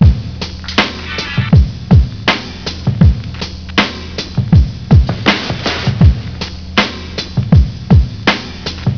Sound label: bronx radio; hns bronx